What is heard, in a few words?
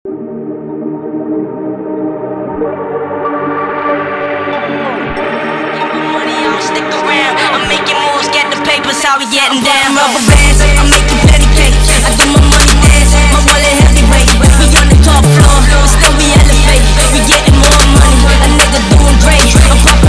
Make bass Speed version